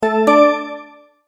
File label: multimedia tone verified